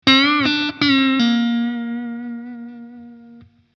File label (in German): Blues Licks